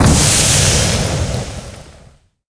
molotov extinguish; weapons; Counter Strike Model Pack; CS2

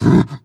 breath short, zombie

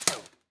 bullet ric; Counter Strike Model Pack; CS2

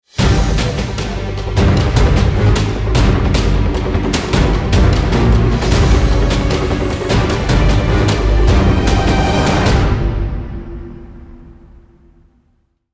round ten sec count, music; CS2; Counter Strike

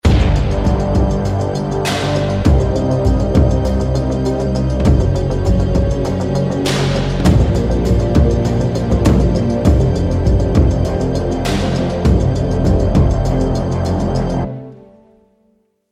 start action, music

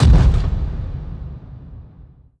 distant step, strider steps; creatures; Half Life Model Pack

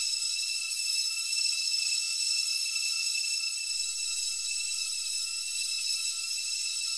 amb abstract whispy lp, ambient; Half Life Model Pack

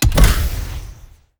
jump ability long; Counter Strike Model Pack; CS:GO; survival

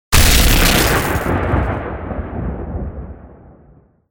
shockwave projectile end, substation; mechanical units; Half Life Alyx

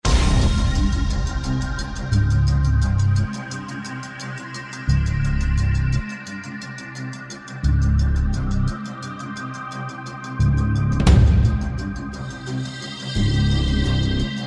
start round, music

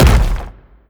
bash soft, brickwall, destruction

